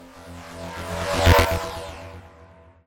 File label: sci-fi text message